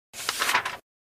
Paper Sound

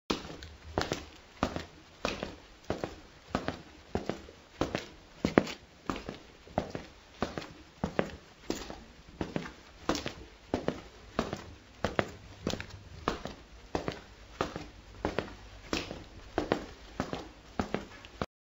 Pasos con zapatos Footsteps Sound Effect

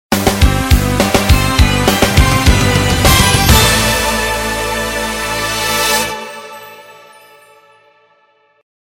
Audio Level Cleared; Sonic The Hedgehog 2 HD

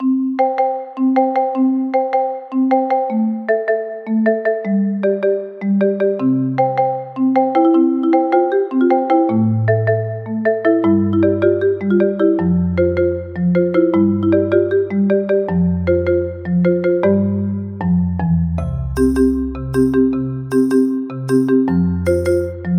Happy Upbeat Background Instrumental

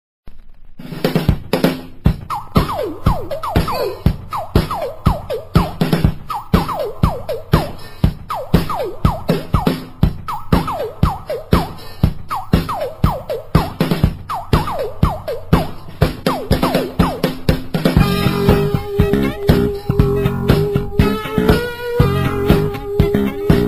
Funk Boogie

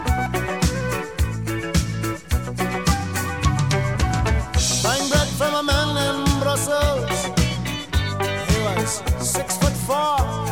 ringtone

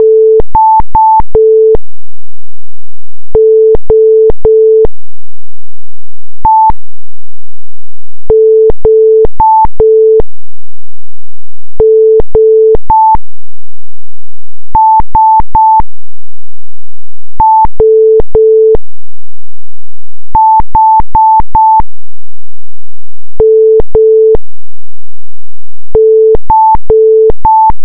morse

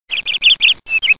tweet

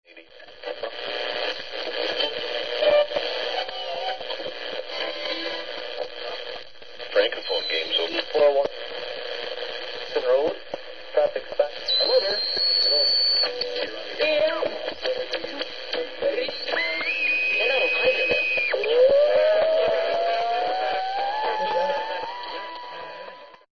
Radio

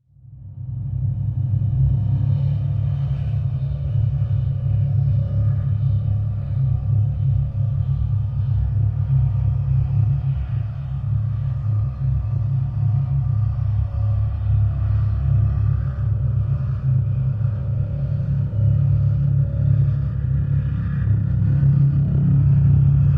Drone Dark

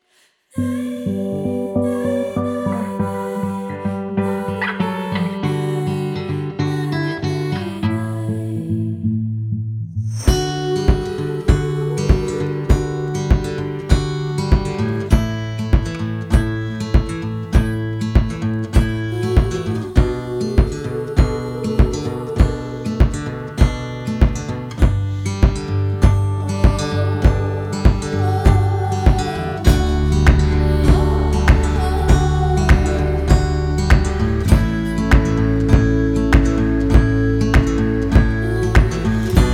forest story acoustic ambient background music

